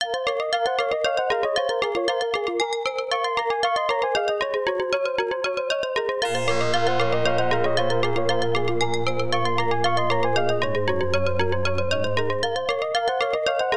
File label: Deli Shop Quartet; Tyrian